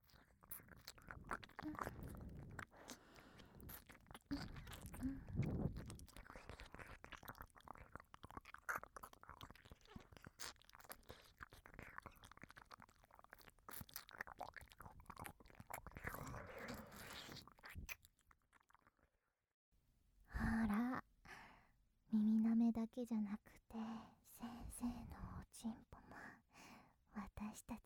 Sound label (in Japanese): ダブル耳舐め, ノイズ未除去; 童貞先生とエッチなおしえごJK